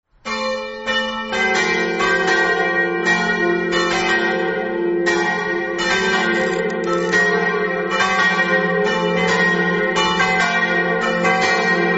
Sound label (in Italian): campane